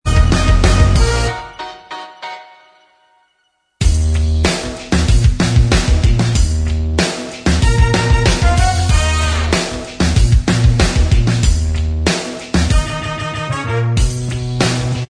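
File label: instrumental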